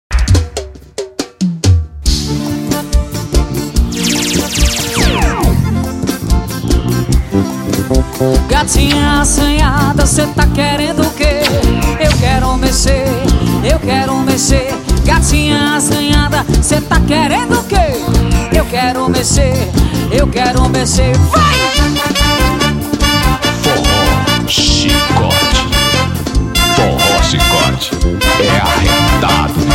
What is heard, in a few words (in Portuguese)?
forró